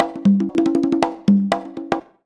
CONGA 119, Modern Percussion Loops; USB Soundscan vol.56 - Modern Percussion Loops; AKAI

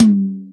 Yamaha CS6, Drums

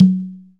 CONGA LO

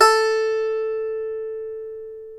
A3 HASAPI; AKAI S6000 CD-ROM; WorldSounds

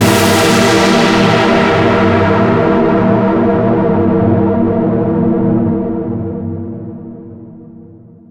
Tense Keys, Drum n Bass; KJ Sawka; Drum n Bass & Beyond

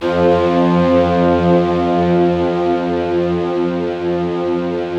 BIG ORCHESTR, Yamaha VP-1

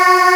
Aahs Ab2

